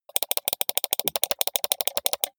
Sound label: computer mouse click sequence steady long